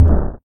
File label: ground Hit; incredible hulk chitauri takedown